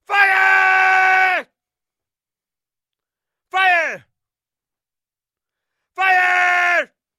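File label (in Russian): мужик кричит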